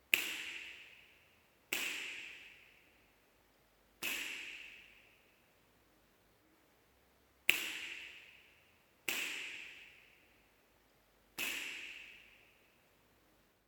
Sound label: Studio Acoustics BEFORE